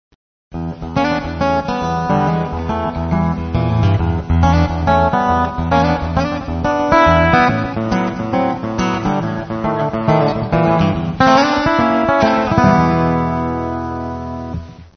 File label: acoustic blues, guitars